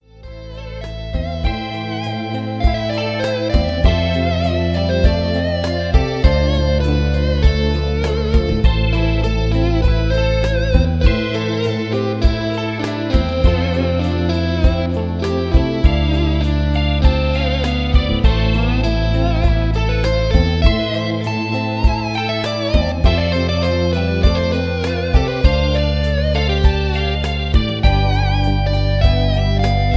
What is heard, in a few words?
Guitar Solo